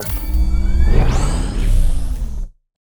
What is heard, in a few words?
command computer; artifacts